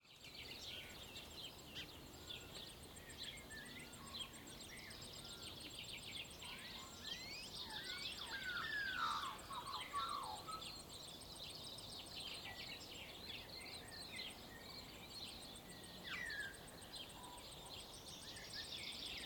Dawn Chorus Birds Magpie Turkey Cow Rural Farmland, Waikato, New Zealand; Hora Hora MKH8040; Aotearoa New Zealand; Trent Williams